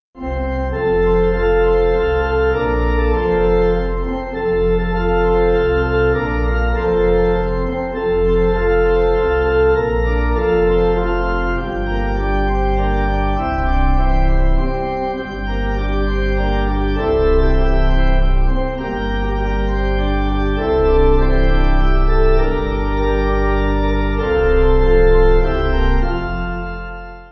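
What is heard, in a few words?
Pipe organ, church music backing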